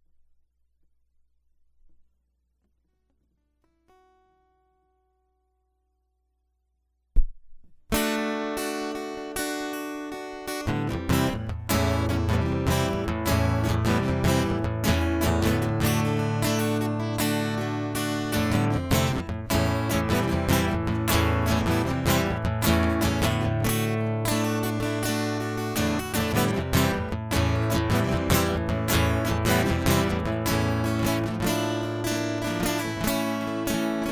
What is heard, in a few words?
Guitar Loop